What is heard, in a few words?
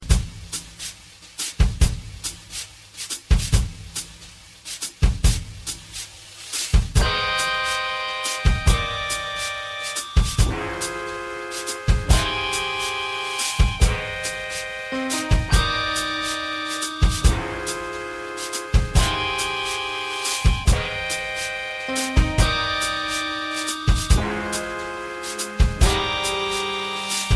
80s Ballad Intro